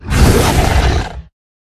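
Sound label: troll buff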